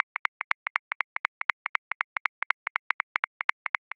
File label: Swing Reggae, Grooves; Drum Booth; Ableton